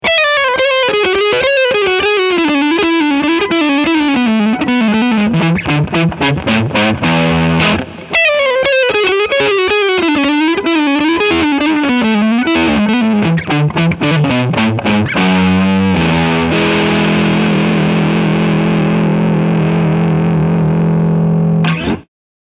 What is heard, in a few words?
Leadsound 2